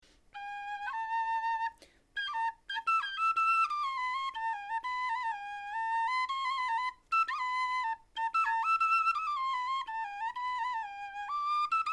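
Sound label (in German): melodie, Knochenflöten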